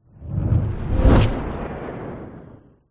sound design whoosh flame fast dark impact thud tail